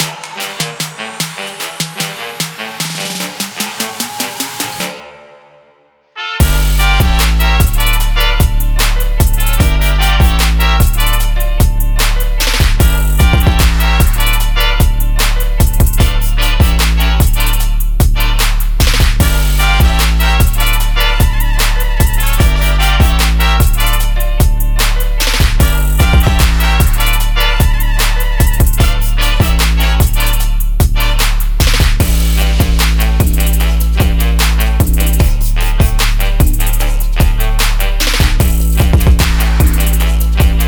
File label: Hip Hop For Vlog instrumental